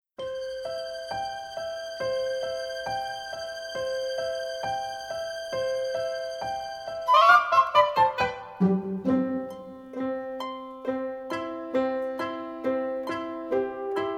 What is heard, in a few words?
Karaoke